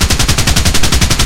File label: apache 30mm gun outside loop